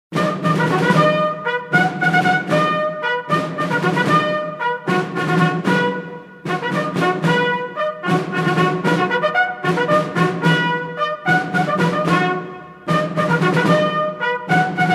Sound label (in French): Au drapeau, Sonneries reglementaires